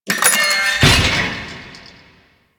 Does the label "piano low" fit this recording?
No